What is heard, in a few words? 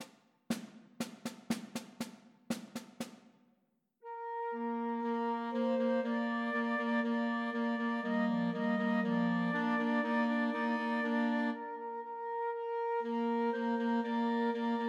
Christmas Trios for Flute Clarinet and Alto Saxophone